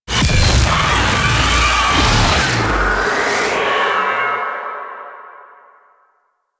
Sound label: Tower Crash; Warframe; Teralyst; Ropalolyst